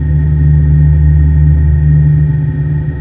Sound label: indoor, ambient atmosphere